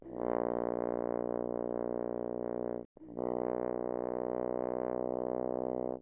Horn, mf, Bb1 B1, french horn; Brass

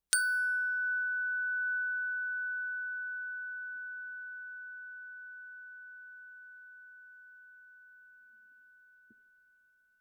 bells brass ff Gb6 stereo; Percussion

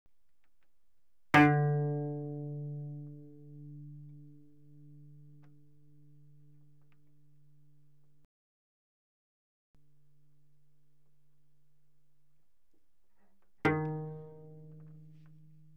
Cello pizz ff sul D D3 B3; Strings

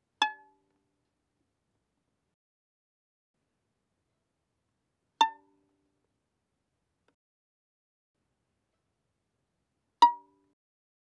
Violin pizz mf sul A A5 G6

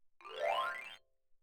xylophone gliss up; Percussion

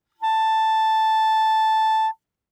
Bb Clarinet ff A5 stereo; Woodwinds

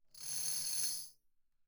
tambourine finger roll mf; Percussion

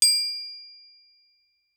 Crotale ff D7 stereo; Percussion; Crotales

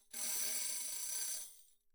tambourine thumb roll mf; Percussion

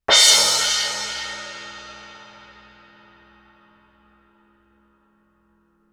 crash mallet ff, Suspended Cymbals; Percussion

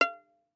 Violin pizz ff sul E F5; MIS Pitches - 2014; Strings

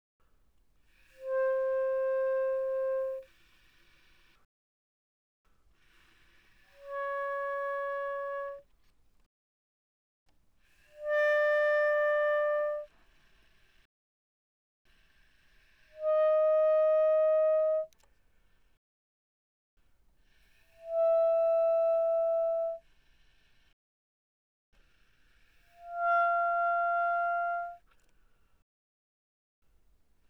Bass Clarinet pp C5 Bb5, woodwinds

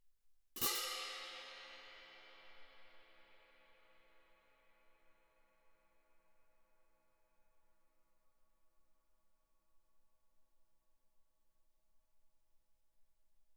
17 orch crash, med heavy, mf, crash cymbals; Percussion

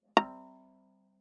Viola pizz ff sul D Bb5; MIS Pitches - 2014; Strings

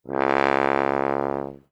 Tuba ff Db2; Brass

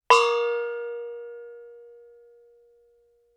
thai gong ff Bb4; MIS Pitches - 2014; Percussion

